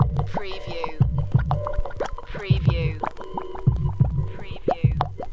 Hip Hop Drum Loop 90 BPM - Sparse With Vinyl

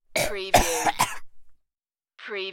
Choke Gag

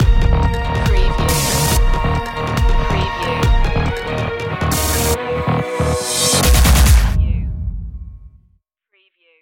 dubstep stinger

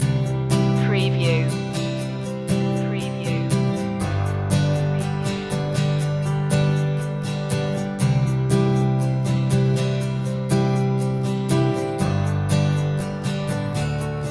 young and free loop

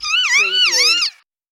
Kea Call

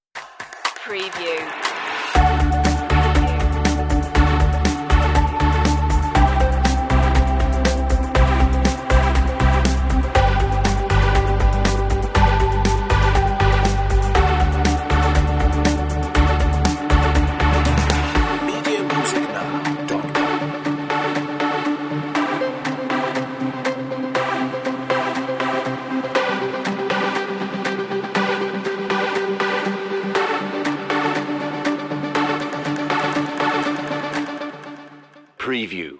synth inspiration

